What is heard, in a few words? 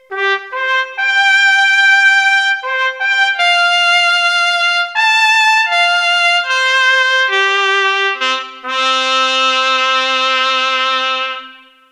Trumpet